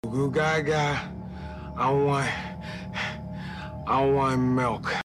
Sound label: googoo gaagaa